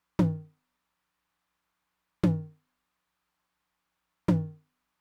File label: mid tom with, Roland TR-909; drum machine; Roland TR-909 Ableton Project